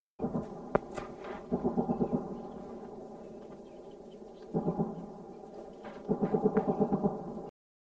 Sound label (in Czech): kulomet